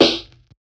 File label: drumbreak snare; Hiphop